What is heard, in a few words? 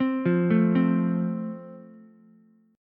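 A Minor Sharp 5th, Ukulele, chord strum; Am#5 arpeggiated; JGuitar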